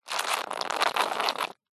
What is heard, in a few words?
foley liquid foamy squirt dribble foamy